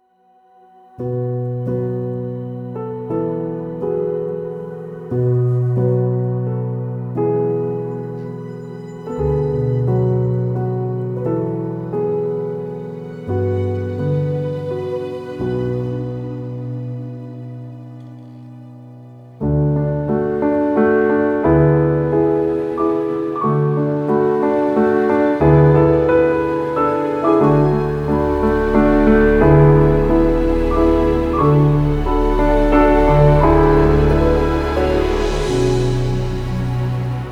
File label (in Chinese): 钢琴; Komplete